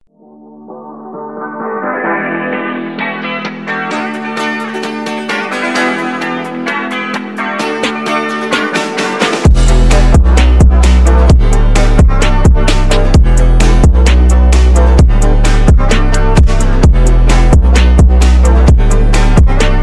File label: Phonk Remix